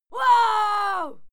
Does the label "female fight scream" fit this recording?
Yes